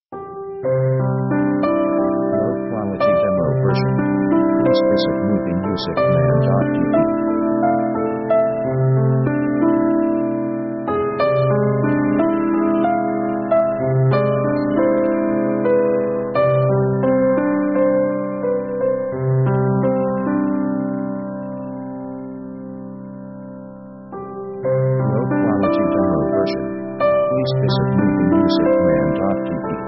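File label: piano pathetic sketch; contemplative themes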